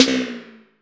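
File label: snare processed, Disco House; USB Soundscan vol.20; Fresh Disco House I; AKAI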